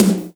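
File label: ROCK TOM HIGH, MPC-60 DRUMS; Greytsounds VOL-1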